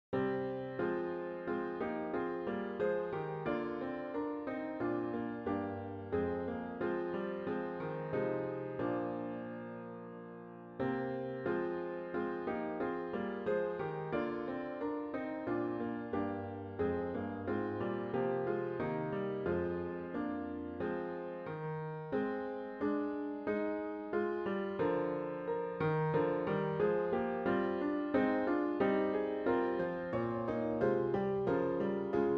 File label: Piano; All Glory Laud and Honor